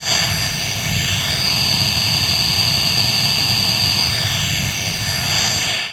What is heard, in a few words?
Static; Blastwave